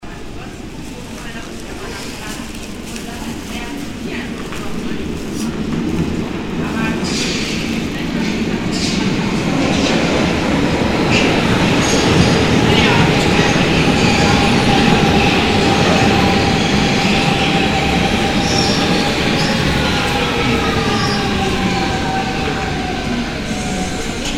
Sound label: subway arrival